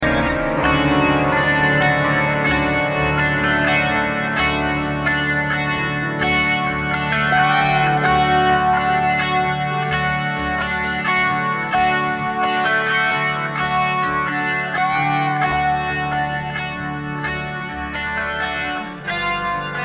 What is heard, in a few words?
guitar up front mix